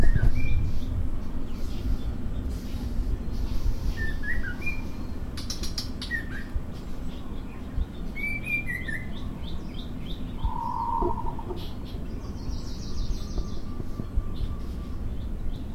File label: Morning birds